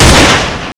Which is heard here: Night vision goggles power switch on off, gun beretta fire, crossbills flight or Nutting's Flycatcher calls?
gun beretta fire